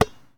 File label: Street Ball Catch; SFX Kit